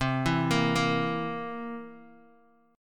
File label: C 7th C, Guitar DADGAD, chord